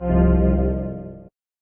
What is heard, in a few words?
Pickup; Gta Vice City